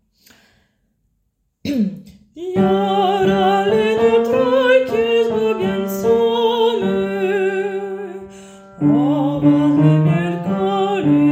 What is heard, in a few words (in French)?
basse haut